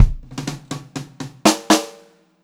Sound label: 126 POP, Pop-Rock Drumloops; 126 POP 4